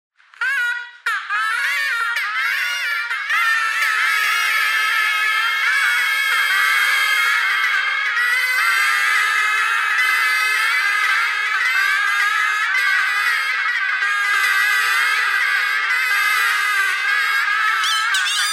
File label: bagpipe geese bagpipe geese thread noise